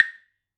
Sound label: Mokugyo